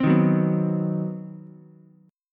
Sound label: C Minor Major 7th Flat 5th down Ukulele, chord; strummed; JGuitar